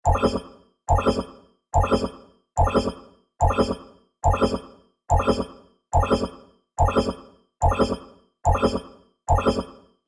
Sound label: Spaceship intruder alert warning loop; futuristic alarm